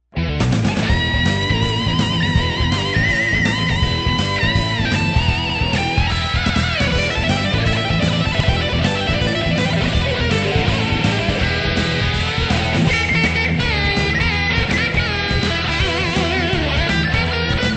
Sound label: walkin session